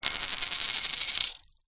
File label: bottle top beer metal cap x1 down spin on table; zapsplat